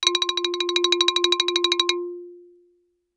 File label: Xylophone rosewood roll ff F4 stereo; MIS Pitches - 2014; Percussion